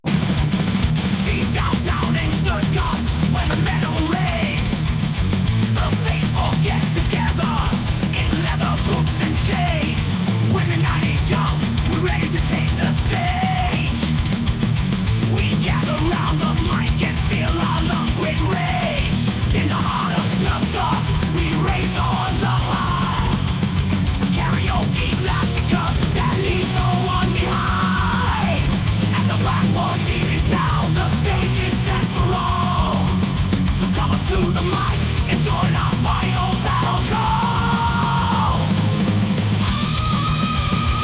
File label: Metal Karaoke Thrash